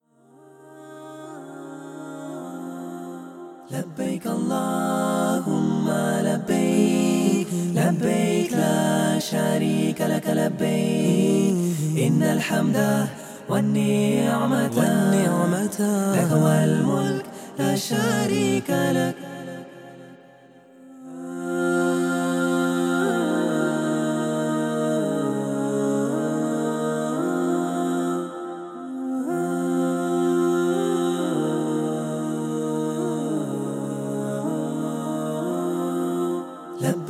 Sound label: Karaoke Version